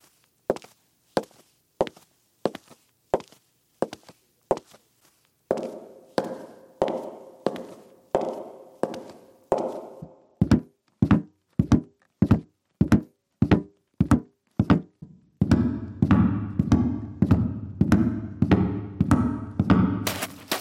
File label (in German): Foley Schritte mit Hall